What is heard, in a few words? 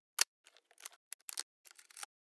Leica m6 shutter and film advance